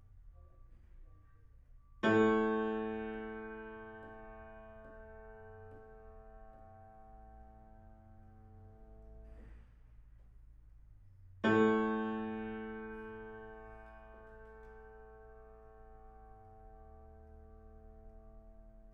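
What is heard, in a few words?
piano room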